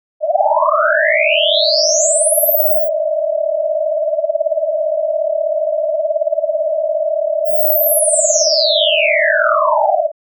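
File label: Ascending Descending Synth Note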